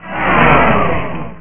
crowd missed

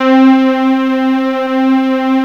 Synth Brass; gmplay

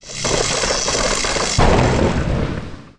flash; swing

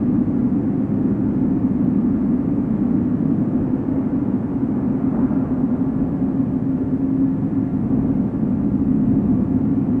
ambloop; DAoC

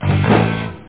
rock

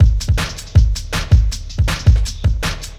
DRUMLOOP D+B 160; Drum+Bass

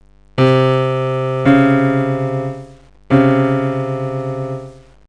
semitone

